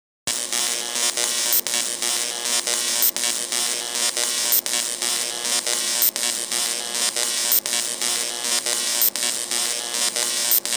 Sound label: vga sound test